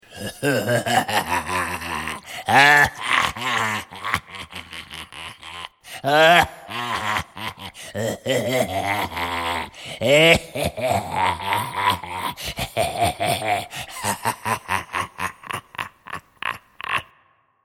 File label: Old Man, Sinister evil old man laughs.; Evil Laughs (Sound Effects:Horror)